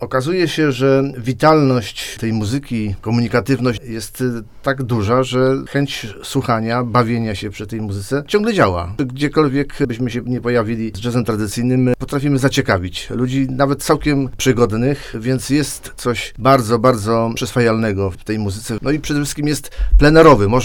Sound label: jazz